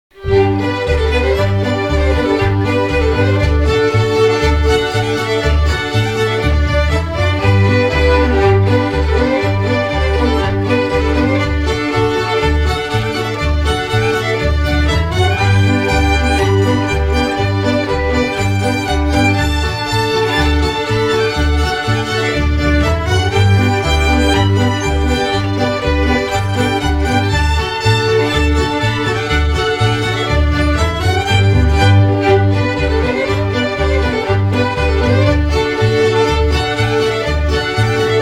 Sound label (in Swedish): polkan